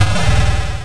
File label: demon jump; mazeofgalious; jorito